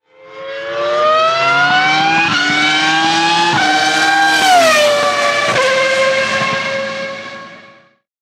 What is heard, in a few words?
F1 car drive by